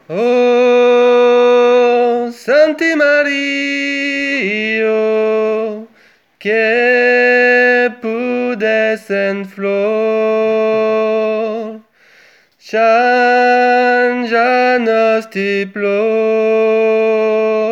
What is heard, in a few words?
solo medium, Chansons